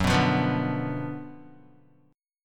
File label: Gb Major 7th Suspended 4th Sharp 5th, Guitar; strummed; 2,2,3,4,2,x; JGuitar